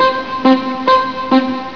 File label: write; usa